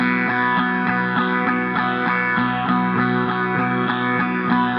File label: Gretsch Ring 100 B, guitar drone